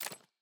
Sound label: toggle left; endfield